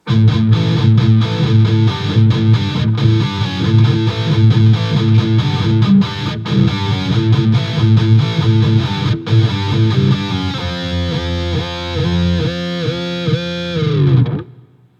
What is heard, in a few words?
Guild S284 EMGs; Guild S284 Aviator with EMG pickups